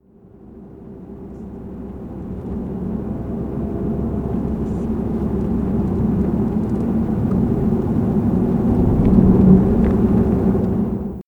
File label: opposing engine; Apotheorasis